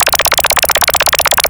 ALARM, SCI FI ALARMS; Ambient; Zero-G; Ambient 1

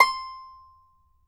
banjo C6, banjo notes; Sampleheads - Whole Lotta Country 1 & 2

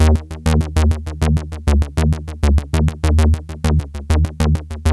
XPANDER; AMG - Vince Clarke Lucky Bastard

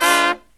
low chord, brass riffs

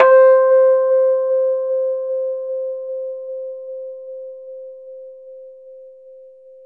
Rhodes 73 Slow, C; E-MU Producer Series Vol. 5 – 3-D Audio Collection; 3DRhodes

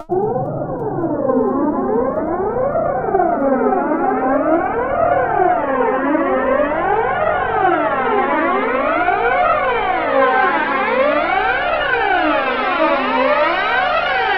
StarShip Sounds

